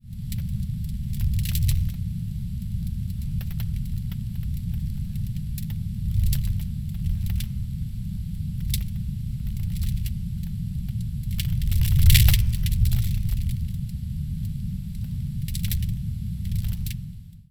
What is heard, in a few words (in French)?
feu Grotte